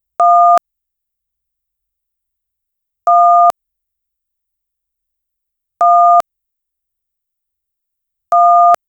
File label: phone number dialed on sm, keypad